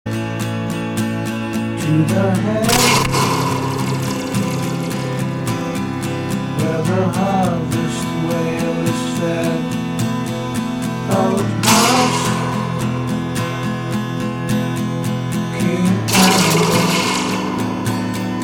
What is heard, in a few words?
guitar